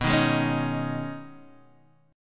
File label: B 6th Add 9th B down Guitar DADGAD